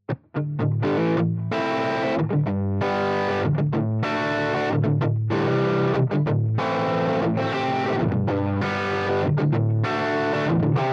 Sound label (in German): Gain Alle Pickupeinstellungen